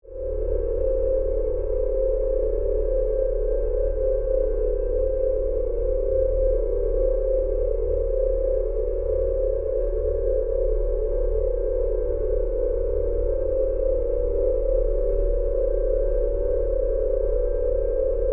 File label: Drone Alien Power, Source, Scifi; Science, Fiction